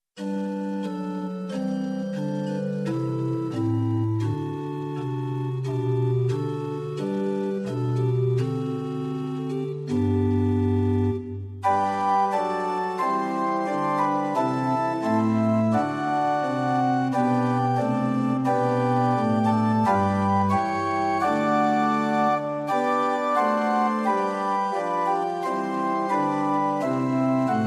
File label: Pitch 440 Temperament Equal; hymn